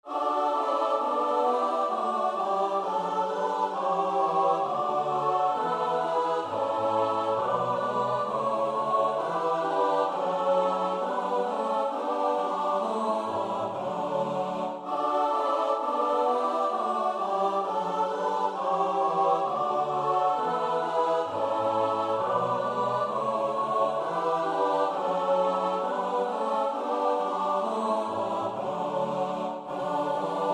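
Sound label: soprano alto tenor bass; Bach (Johann Sebastiaan); BWV 080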